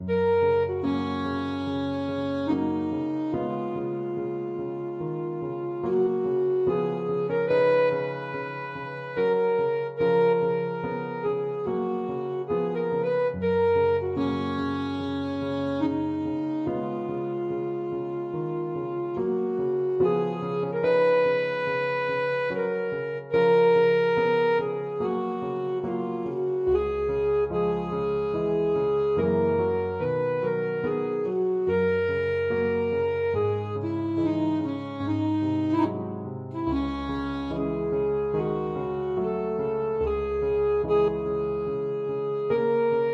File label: saxophone